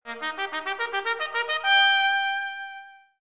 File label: trumpet